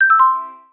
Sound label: shutdown